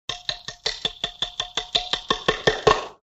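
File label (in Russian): zvuk chastyh udarov molotka, rapid hammer blows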